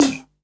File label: natural tom drum c sharp key